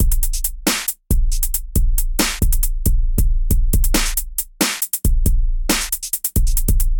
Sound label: Synth Bass (Csus) 120 bpm Dirt Kit Hard; Prequel; Ableton; Factory Packs; Samplification; Fizner Bass